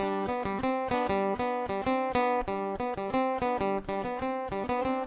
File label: fill riff, guitar